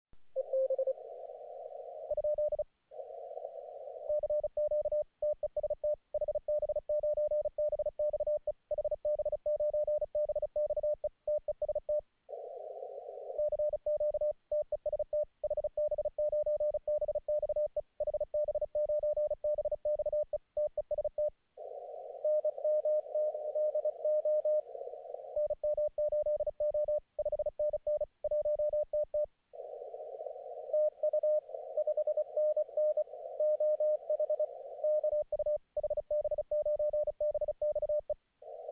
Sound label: QSO, Morsekurs